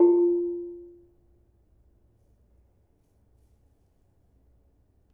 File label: Bonang Barung soft, gamelan